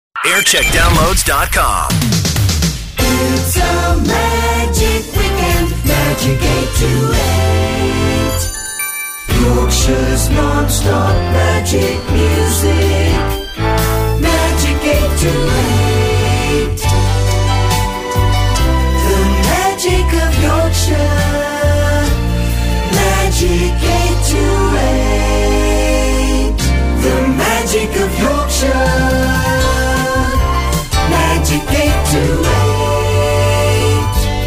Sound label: Jingle Montage; Magic 828; TM Century